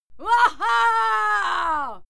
female cheer wuaha; schreie; menschen

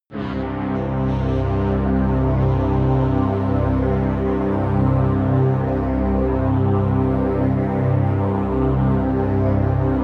pad sweep falter; flaechen

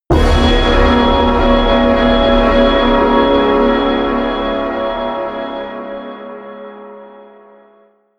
eastern hit

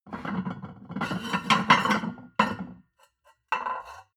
plate on plate take multiple, restaurant cafe

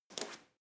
medicine ball throw; sport freizeit; fitnessstudio

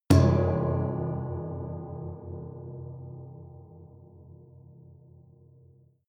underwater metallic

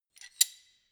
wrench open; schrauben drehen hydraulik

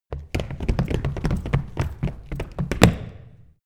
step group run stop abrupt

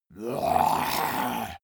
monster growl throaty; kreaturen

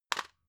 drug package drop soft; arzt

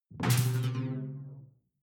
sci-fi alien splash detuned soft; swooshes-swishes

